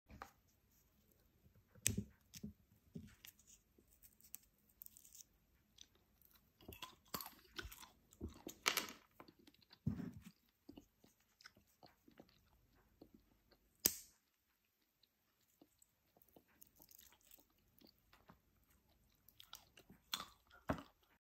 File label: Open pistachios